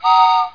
whistle

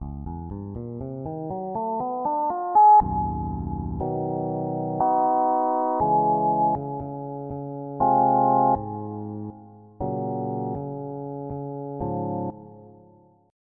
Synth Bass; EverQuest

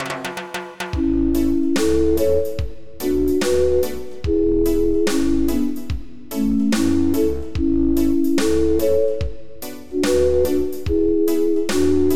Reggae

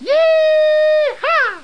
Win; RealPool DEMO

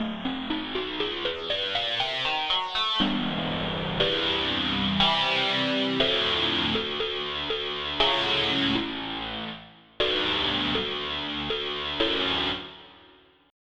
Sync1 Wah Slo

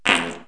Passing Moderate Wind; KABOOM! - Wild and Wacky Sound Software; Human

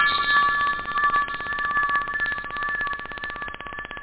ting; da capo vol1; drums nature

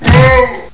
fem hit; MacAddict; Carmageddon Demo

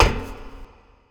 foot thick metal; Earth and Beyond; EA Games

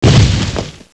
Impact; Princess and the Pea; SoftKey

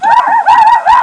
bump

